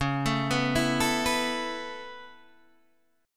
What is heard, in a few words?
C sharp Minor 7th Sharp 5th C sharp Guitar DADGAD